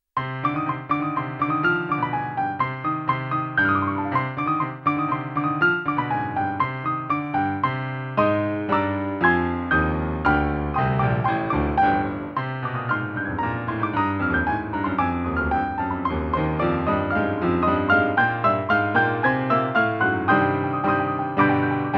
oh infamia piano

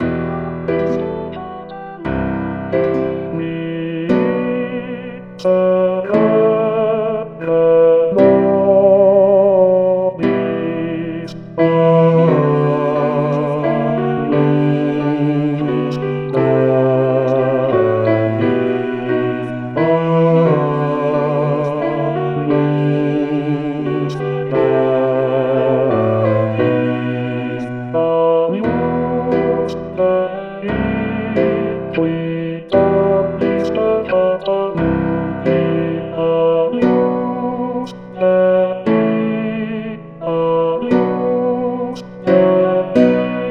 bass agnus dei

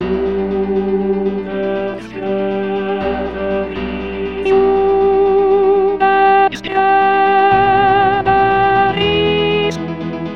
tu rex alt; te deum de georges bizet

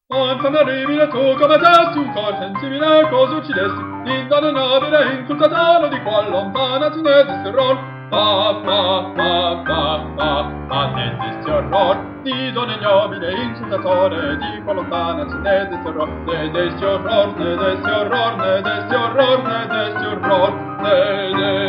oh infamia basses

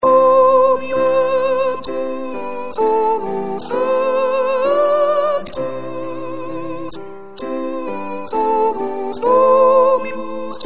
sop gloria

